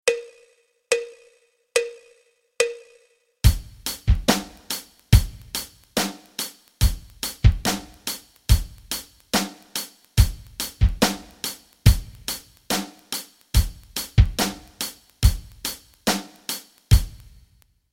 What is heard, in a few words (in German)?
rock rhythmen